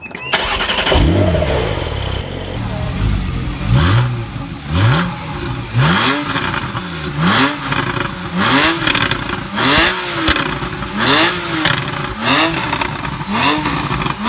porsche 911 targa 4s

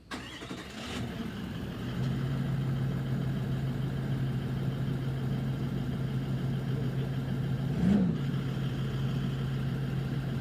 toyota land cruiser v8

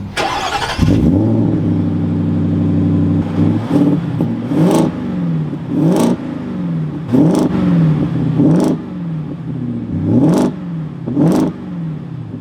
ford f 150 raptor

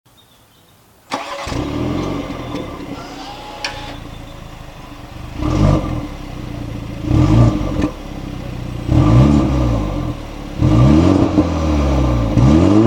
bmw g22 430i

